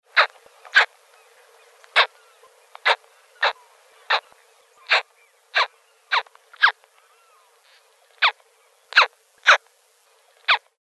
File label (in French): Cigogne maguari